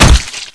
hit bod, weapons; svencoop; echoes

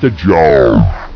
die; svencoop; cracklife; agrunt

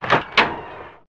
opening wood; svencoop; lostmaze

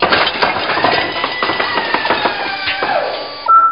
reload; svencoop; frostline

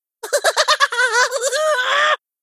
laugh; svencoop; gruntsparty; psychward

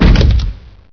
door stop; mistake coop

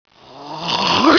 button; svencoop; cracklife; buttons

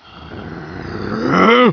door move; svencoop; cracklife

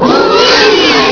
attack, spider; svencoop; classic

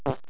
zap, debris; svencoop; cracklife

